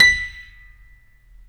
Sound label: StereoGrand, Keyboards; E-MU Producer Series Vol. 2 – More Studio Essentials